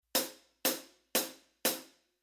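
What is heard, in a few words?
Rhythm Drum Set Hi-Hat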